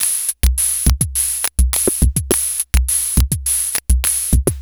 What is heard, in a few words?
Upbeat 104, Lounge Drumz